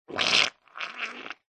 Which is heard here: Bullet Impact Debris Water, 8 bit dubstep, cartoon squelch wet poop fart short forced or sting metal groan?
cartoon squelch wet poop fart short forced